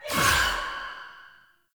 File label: Choir Erupt Proj Throw